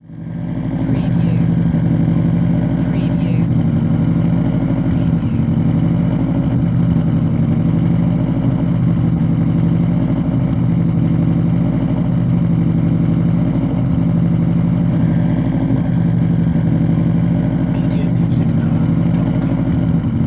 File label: SUBARU STI EXHAUST IDLE